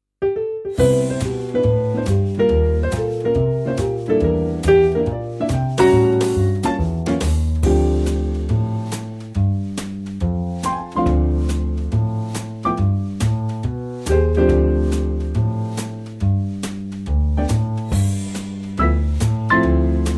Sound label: acoustic; key - C - vocal range - C to D; A lovely reworking of this great standard - a mid tempo arrangement